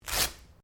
Clothing Velcro Rip Short; Movemement sounds for various articles of clothing; Ripping Sound Effects

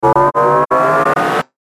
Stutter Transmission Ascend

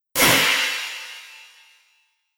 Metal Explosive Impact; Miscellaneous Impact Sound Effects

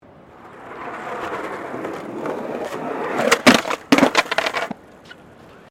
SKATEBOARD FLAT JUMP CRASH; Skateboard sounds; Skateboarding; Sports; Foundation4

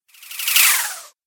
Stuck Whoosh; sound design

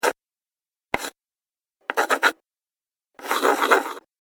Pencil Sounds, Writing; human sound effects

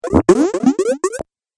Laser Gurgles, sci-fi laser sound effect; Laser Sound Sound Effects

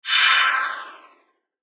Whoosh Heavy Flange Shimmer; Blastwave; Whoosh Sound Effects

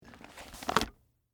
Bible Open Cover, Book, On, Table; Foley; Books; Offices; BlastWave

